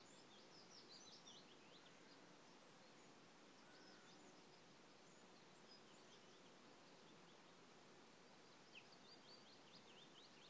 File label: kerry morning birdsong